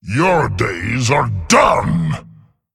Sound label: Male Dragon Attack